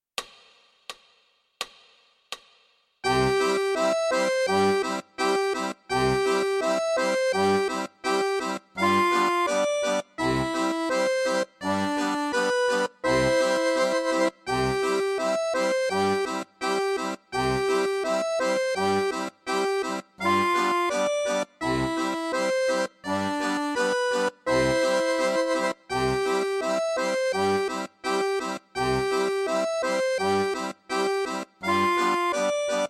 tango; Czerny

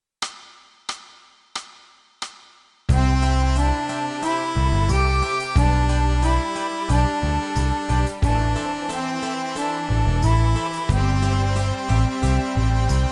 Fisa tutti

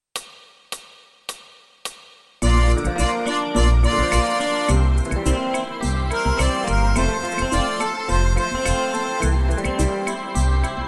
Fisa tutti

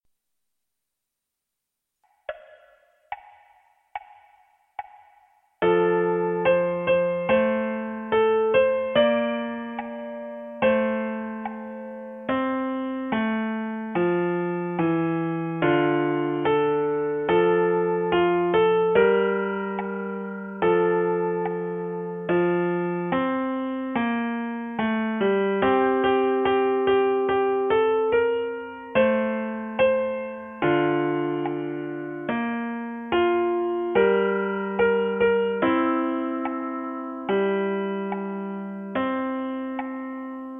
piano unite

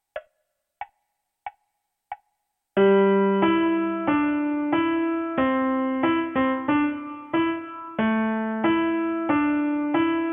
piano unite

